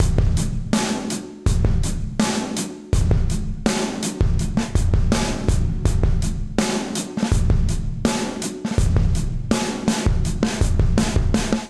LowAndLoud Groove LowLoudSquashed 82 bpm, Session Drums; Groove2; Session Drums Club; Ableton; Factory Packs